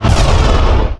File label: gep gun fire; weapons; deusex